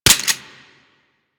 Fire Mech, Weapons; Warframe; Boar

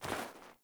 Sandals Walk Snow, Footsteps; Characters; Player

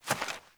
Fawn Step; Encounters; Animal Rescue

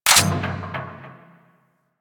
Crew Ship Turret Mech; Warframe; Vehicles; RailJack; Crew Ships; Grineer Crew Ship; Turrets

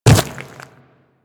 Quadruped Medium Land Default, Footsteps; Warframe; Infested

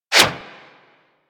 Bullet Parry Metal; Warframe; Bullet Parries

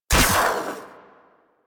Amp Barrel Shot, Weapons; Warframe; Operators; Amplifiers; Amp Set 2

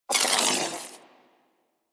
Ball Held Spark; Missions; Tenno Ball

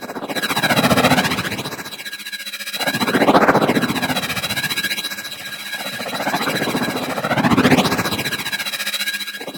Arch Wing Movement Choppy Loop, Vehicles; Warframe

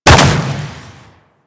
Pulse Exp Impact Default; Warframe

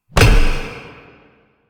Bash Hit; Warframe; Warframes; Hoplite

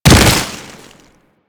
Land Snow, Footsteps; Enemies; Kubrow; Warframe

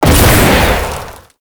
Spike Orb Fire Impact; Tau; Tau Circles Of Hell

